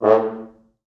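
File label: French Horns staccato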